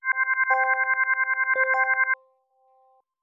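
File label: granular kalimba accent C major; accents; bespoke - Floral v.2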